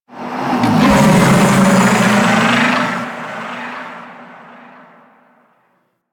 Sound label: Vocal Attack, Enemies; Warframe; Teralyst